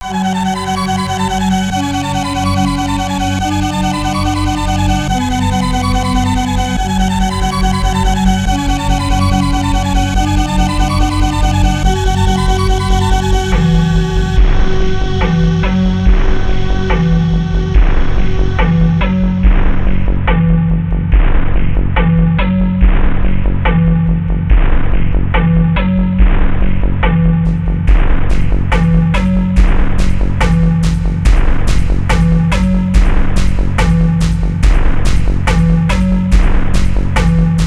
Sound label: The power of the flute